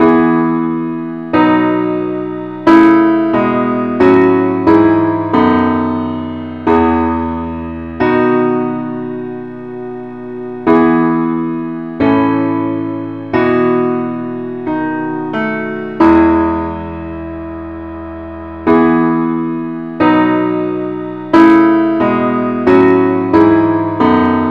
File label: parts only key e